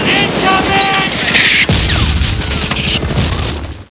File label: incoming